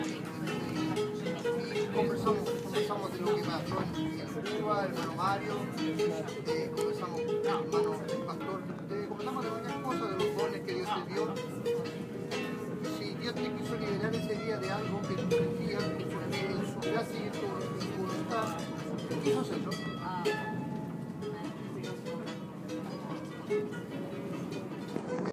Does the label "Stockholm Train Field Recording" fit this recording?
Yes